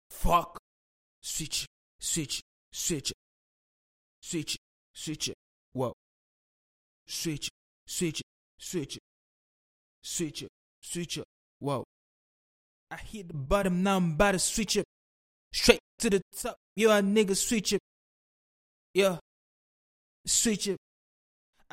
HH 82, acapella

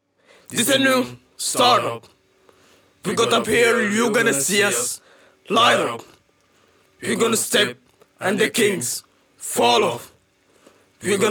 Rap Sing 120, acapella

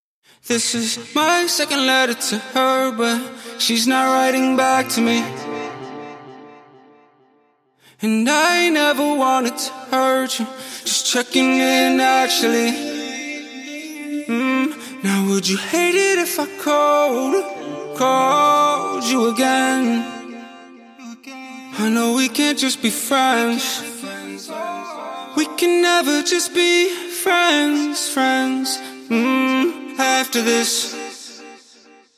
100BPM Key C, acapella

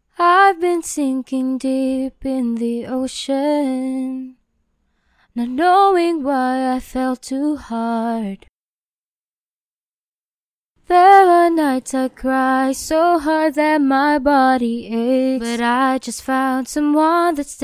pop 90 BbM, acapella

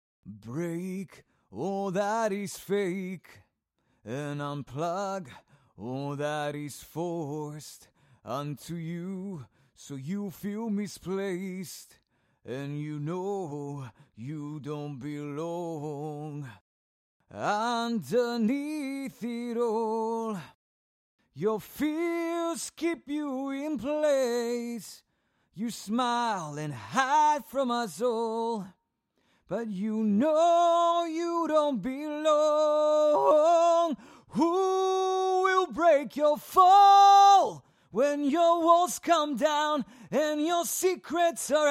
acapella, rock 85 DM

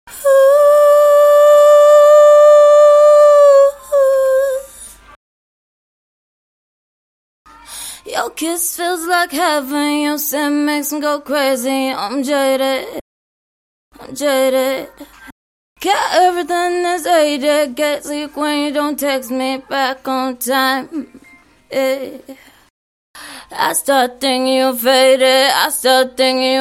pop female male sing 63 CM, acapella

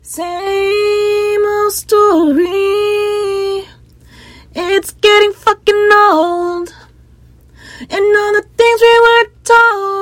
pop 68 Bbm, acapella

